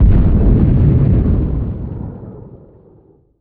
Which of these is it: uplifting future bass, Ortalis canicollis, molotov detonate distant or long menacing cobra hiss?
molotov detonate distant